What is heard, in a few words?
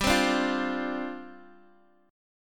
G 7th Suspended 4th G, Guitar Open G; down